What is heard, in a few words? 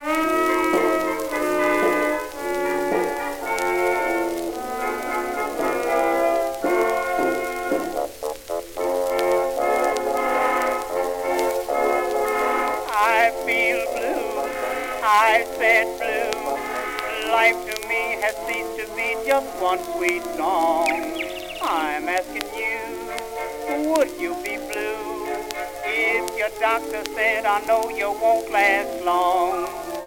blues jukebox